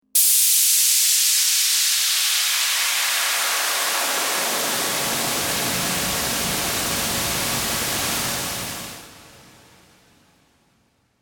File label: Pressure Release, High pressure steam release.; Sweepers; Sound Design